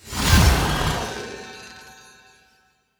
radiant wave cast; heroes lumenir

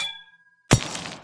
freeze

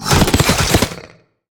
crocs hero throws spikes

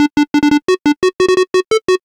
hammer time

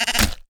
sheepy bridge baa; sfx

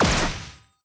tower basic Attack; elven Stargazer

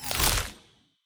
movement loop end; durax

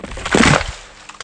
teleport out; bravebark

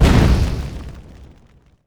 fiery nut explotion; tree

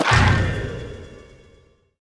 sigil of silence; tower necromancer

